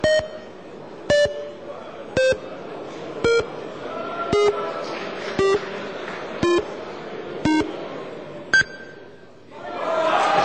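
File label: timer sounds; zvuki tajmera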